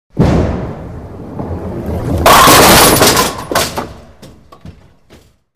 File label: Bowling Ball Rolls